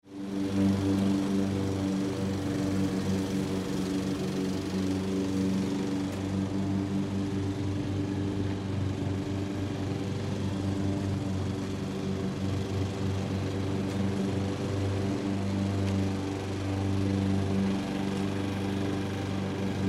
Subdivision Distant Landscaping Golf Course Lawn Mowers; City; Ambience; Blastwave